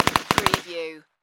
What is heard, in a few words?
Machine Gun UZI Model A Short Burst Double